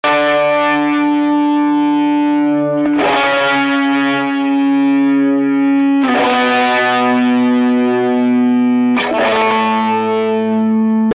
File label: guitar